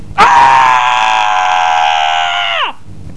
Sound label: scream; PC Gamer 5.6